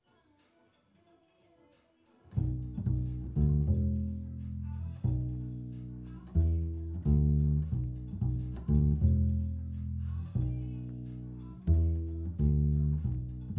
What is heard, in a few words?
Bass Mic